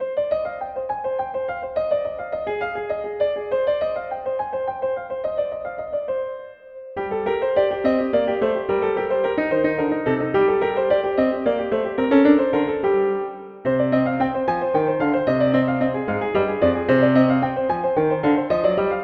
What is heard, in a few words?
fugue in c minor piano solo